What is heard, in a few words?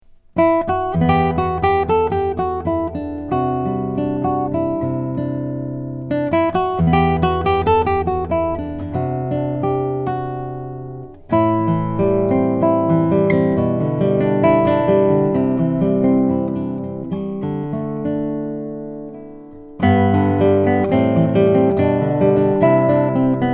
lowden s35j madagascar rosewood